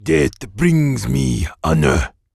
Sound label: Oni Death